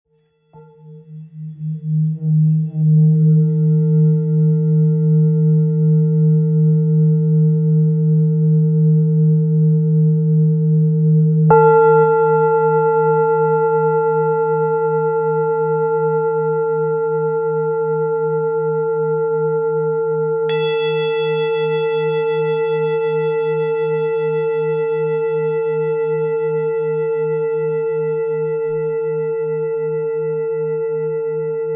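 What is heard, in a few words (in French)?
Bol therapeute re diese, bol chantant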